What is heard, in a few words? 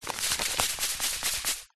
seeds packet black chia shake